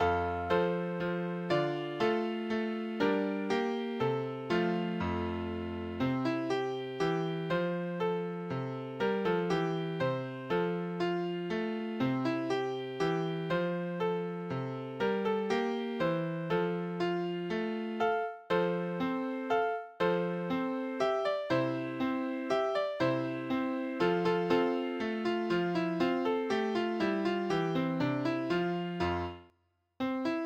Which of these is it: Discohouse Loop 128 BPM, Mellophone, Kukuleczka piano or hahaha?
Kukuleczka piano